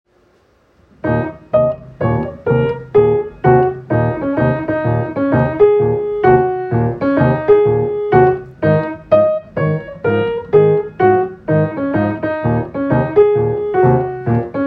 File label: black key blues; Maggies black key blues